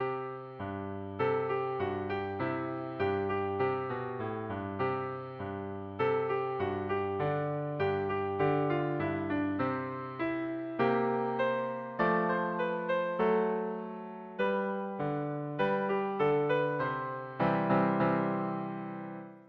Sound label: piano